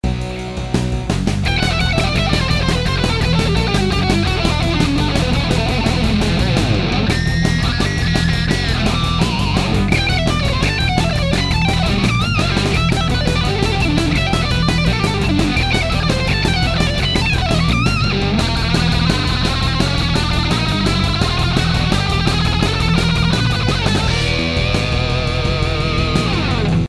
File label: solo